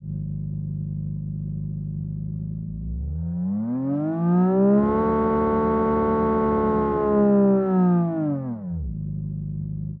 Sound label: i6 german sport ext, engine sound